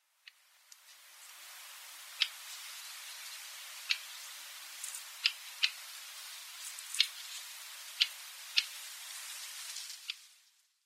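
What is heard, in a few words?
Bluethroat; sakata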